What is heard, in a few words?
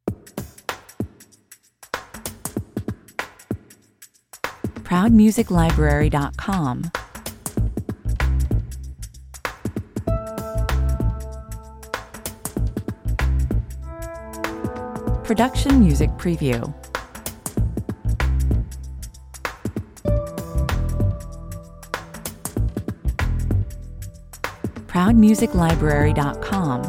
turmoil activate (no strings)